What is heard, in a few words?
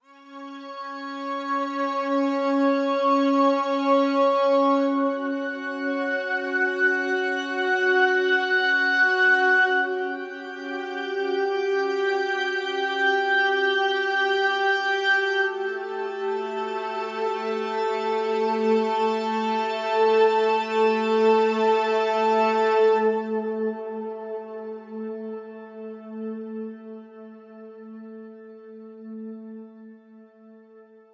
bg music loop; lowglow